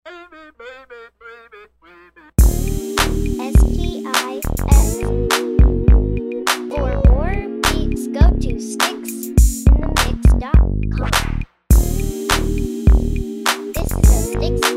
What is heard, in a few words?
Zapping